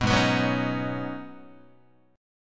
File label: G sharp Minor 11th G sharp Guitar, chord; G#m11 strummed; JGuitar